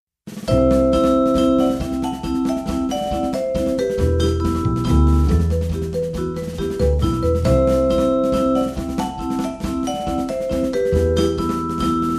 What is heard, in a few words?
Vibraphone